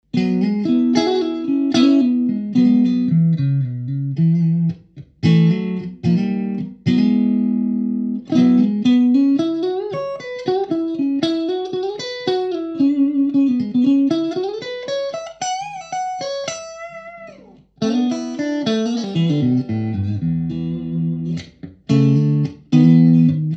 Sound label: overwound clean neck middle; schwarzbach